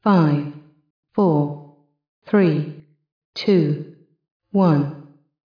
guns; PC Gamer IT CD 41; machines demo; campaign

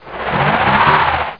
SPIN ICE; mtmtrial

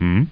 MMMM

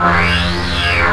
fold space delivery effect; Earth and Beyond; EA Games

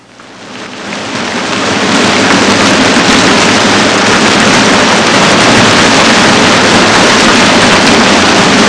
rainstorm; Nature; Sound Library 2000 - Wayzata Technology (1995)

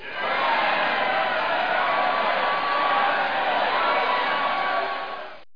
SWELL; DECATHALON

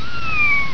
KITTEN

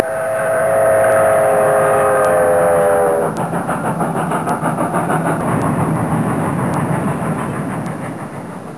Steam Engine Approaching; Soundsation; Vehicles

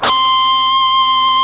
GUITAR HI SUSTAIN; MGUITAR HI SUSTAIN

